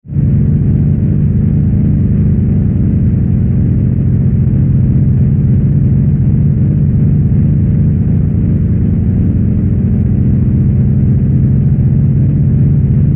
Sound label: Bed Synth Low Fuzz Pulses; Bed Sound Effects; Blastwave; Production Element Imaging Element Accent Transition